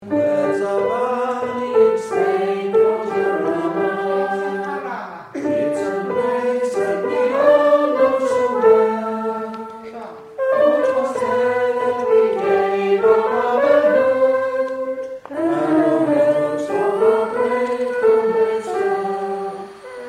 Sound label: Tune